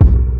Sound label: Kick Alarm; RnB